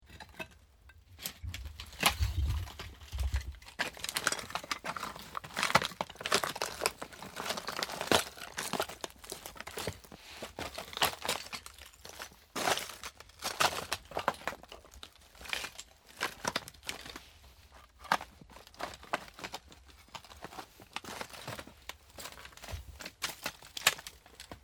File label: Nodar Portugal Walking On Slate